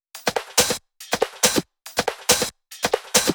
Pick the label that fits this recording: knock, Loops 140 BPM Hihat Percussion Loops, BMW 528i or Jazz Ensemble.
Loops 140 BPM Hihat Percussion Loops